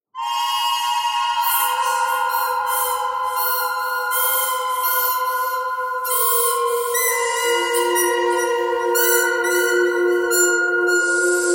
bird canyon bird thread pentatonic

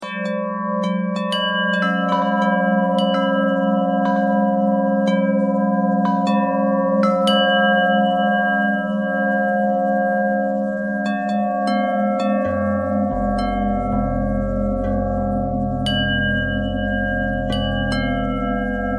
bell bell thread pentatonic, bells

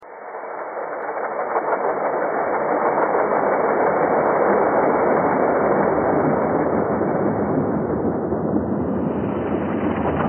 thread pentatonic

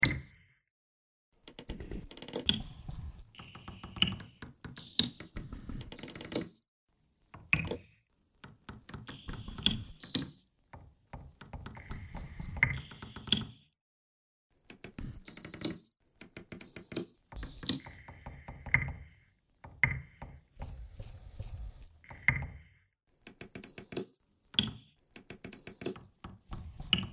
bagpipe noise

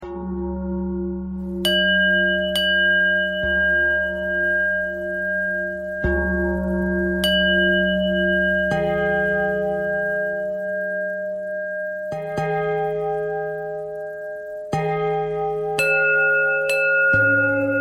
bell thread pentatonic

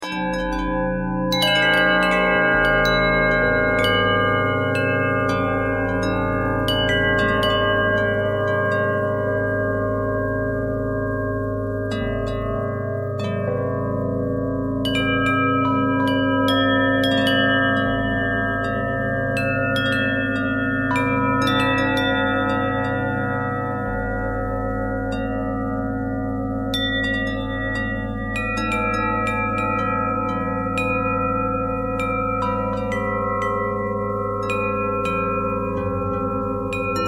bell harmonic twist

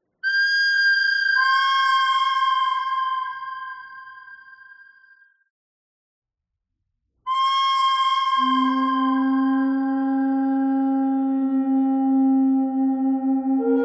bird thread pentatonic; noreverb

